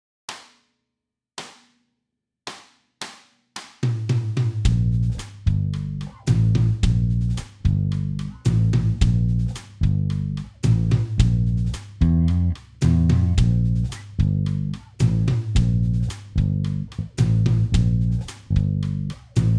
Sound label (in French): playback A B s chords